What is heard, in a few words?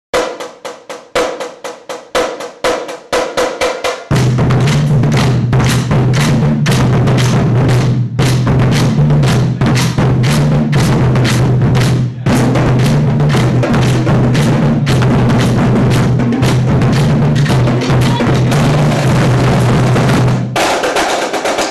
drumline